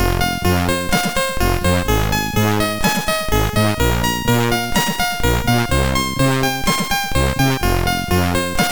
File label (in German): Kleines Echo, MOS6581; MOS6581R4; hvsc